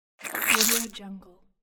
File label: squeezing; applehillstudios